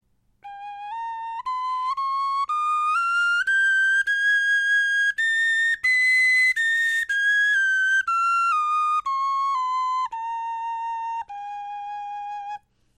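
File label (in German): tonleiter, Knochenflöten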